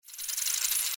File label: bonus prize coin spin start; Temple of Iris 2